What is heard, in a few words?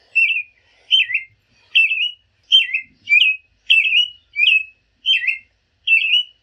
American Robin